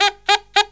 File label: SAX